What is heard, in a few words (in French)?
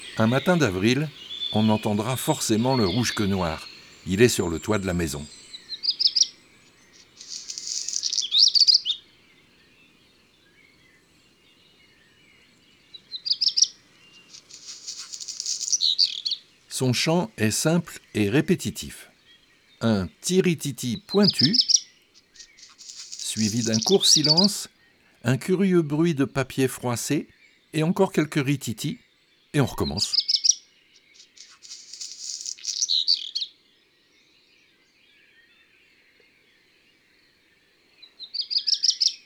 Avril Le Rougequeue noir